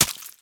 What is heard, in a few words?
Egg Pickup Spider; The Inn